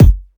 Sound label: thumpy bass drum F sharp key; kick drum; kick drum samples